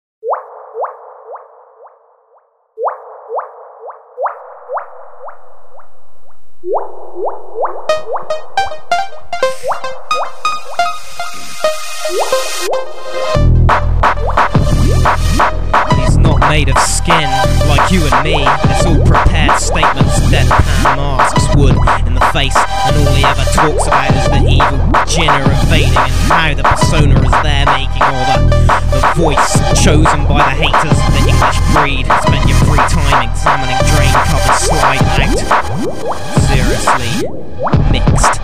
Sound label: ambient, Raps